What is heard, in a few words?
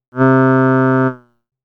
Nasal, synthlead